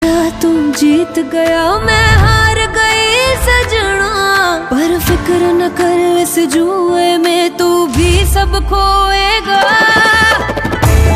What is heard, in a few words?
Ringtone